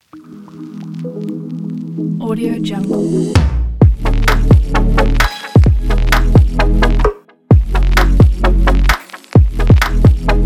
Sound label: fashion showreel